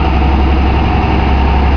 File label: run; finnish